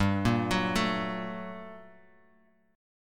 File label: G Minor Major 9th, down; arpeggiated; JGuitar